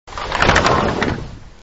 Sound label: door